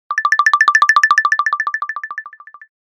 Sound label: Vibration; Tecno Spark 40C